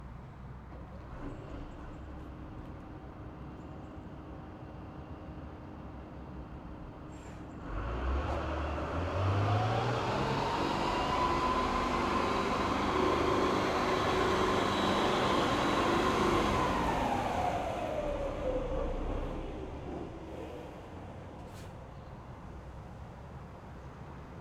exterior fast distant forward reverse, APC Renault VAB; Pole Position